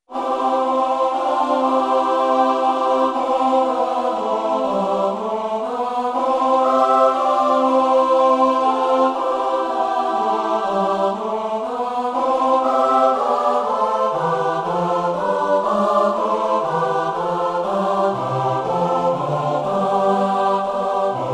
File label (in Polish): satb dzieweczko ze slaska calosc